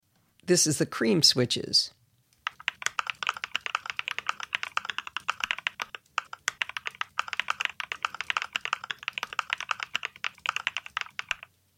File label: Klack; Cream